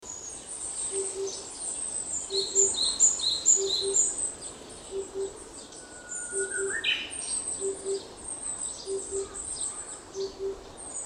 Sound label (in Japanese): tsutsudori sankouchou